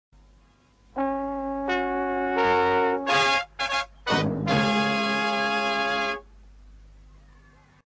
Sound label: yorkshire tv ident